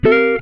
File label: GTR CHORD; Univers Sons; Jazzistic